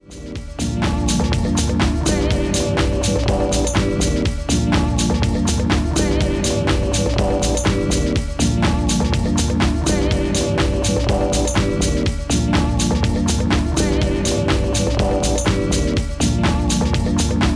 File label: house